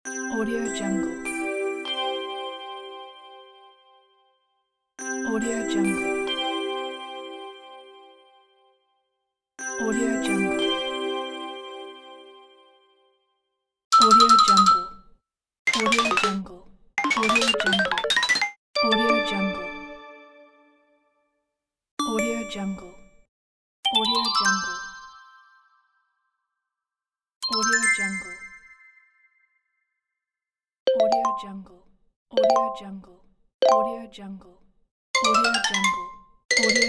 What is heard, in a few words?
the notification pack